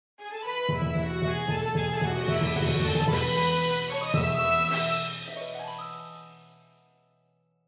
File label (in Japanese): ファンファーレ; オープニング